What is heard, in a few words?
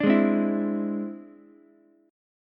E Augmented 7th E down Ukulele; E+7 strummed; JGuitar